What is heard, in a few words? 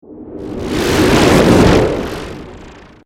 Warp, Dimensional, Science Fiction; 5.1; BlastWave